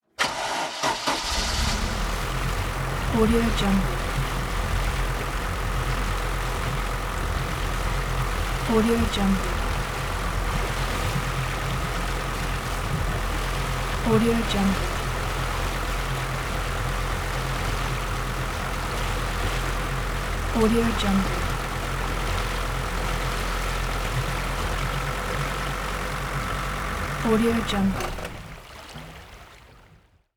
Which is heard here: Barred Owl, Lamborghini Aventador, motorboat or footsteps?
motorboat